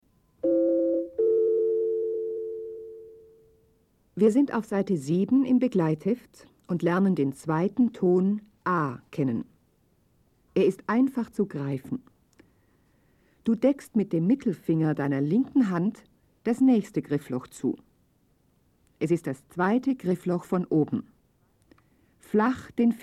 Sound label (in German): Spiel Blockfloete